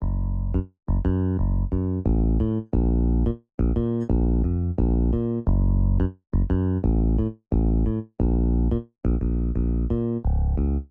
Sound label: Classic Melody Loop 88 BPM A# Min Bass; Melodies; Loop Stems; Cymatics; Oracle Sample Pack